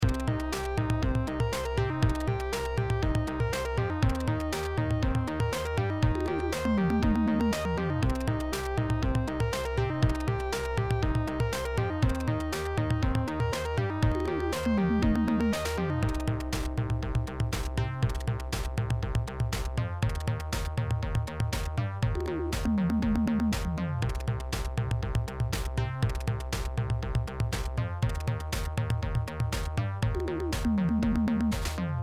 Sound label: bass basstone lead sound beat